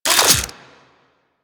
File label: Air Pistol Unequip; Warframe; Corpus